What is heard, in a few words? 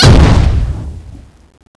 footstep; cstrike; Teddy CSO